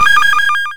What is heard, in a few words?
Sound Reach Goal; arcade-canvas; ninja-runner